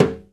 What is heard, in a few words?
MUTED HAN, Timbale; Timbale Battery